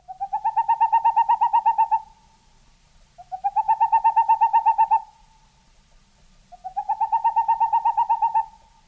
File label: Tengmalm